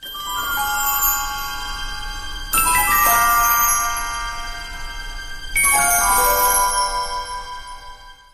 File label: twinkle